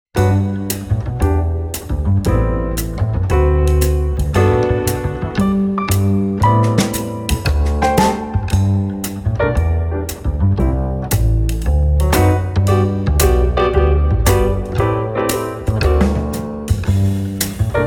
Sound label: Mid Tempo Swing; Practice Song No 5